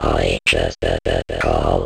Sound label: Vocoder Loops 128BPM